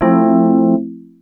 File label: wurlitzer, dx7